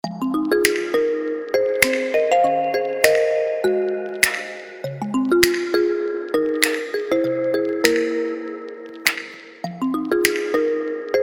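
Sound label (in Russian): ksilofonchik, little xylophone; Ксилофончик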